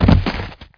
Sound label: body fall; MOTS